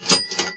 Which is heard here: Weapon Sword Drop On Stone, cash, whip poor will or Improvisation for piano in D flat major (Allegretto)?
cash